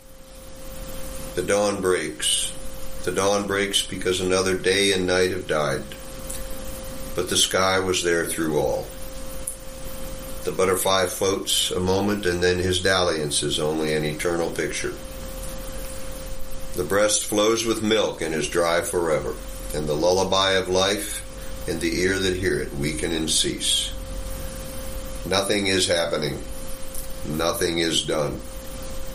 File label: Carillon; The Dawn Breaks